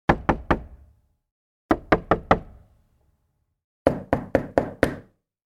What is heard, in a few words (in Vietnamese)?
âm thanh tiếng gõ cửa